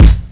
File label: KIK, Dirty Hip Hop Kit; style kits